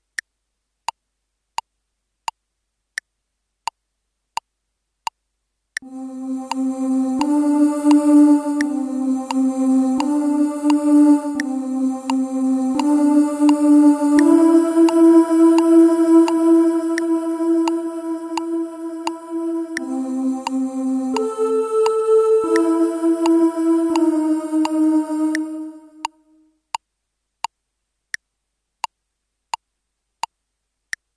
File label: Alti